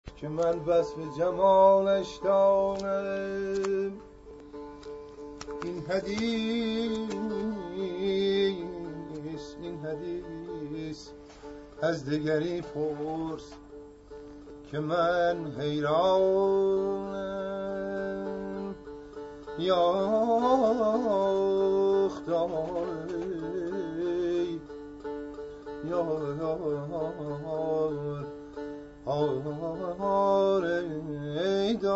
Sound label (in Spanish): nava canto impro, Musica persa